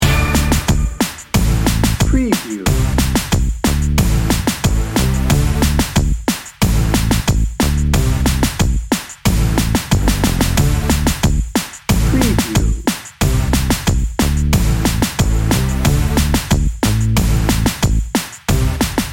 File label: reggaeton happy upbeat bed